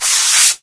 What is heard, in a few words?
foot drag; doom3